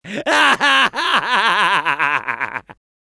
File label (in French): Medic laugh long